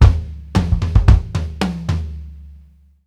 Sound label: TOMS, drumworks fills; Sampleheads - New York City Drumworks VOL-1